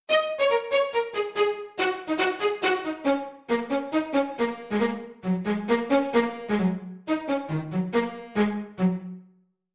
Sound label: Extra String Attack